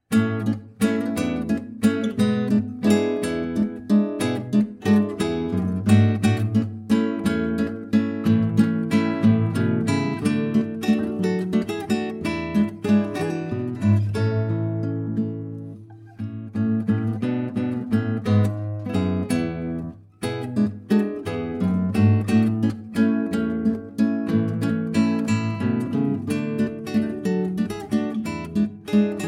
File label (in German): Weihnachtswalzer